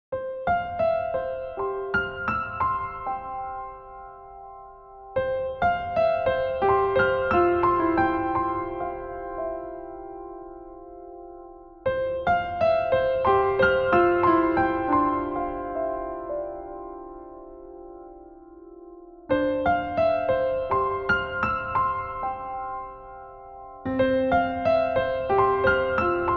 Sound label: Sketching combined pianos